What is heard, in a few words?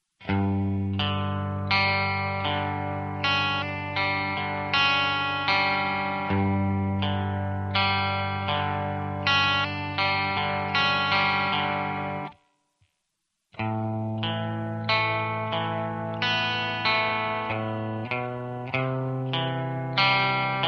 Rock guitar; yao gun ji ta